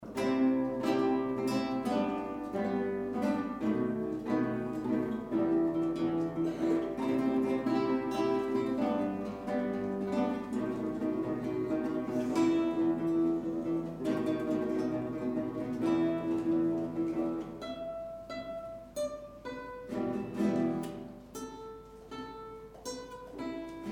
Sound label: guitar